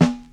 clear snare drum sample b key; snare drum samples

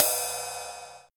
cymbal sound d key

